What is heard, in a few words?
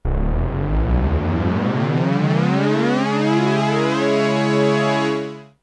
memorymoog polyphonic glide